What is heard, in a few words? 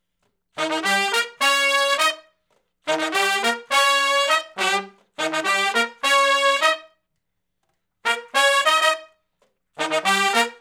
R B Riff (Eb) unison, Horncraft; Sections